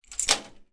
household keys unlock metal gate security grill door